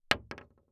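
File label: Weapon Bullet Shell 12 gauge Drop On Solid Wood; AdobeCC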